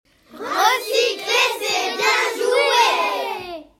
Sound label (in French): cri